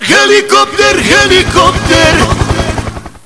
helicopter

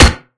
minigun fire; scmod; ut99

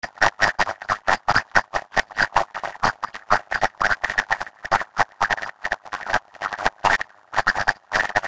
scanner talk; scmod

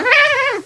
cat pain

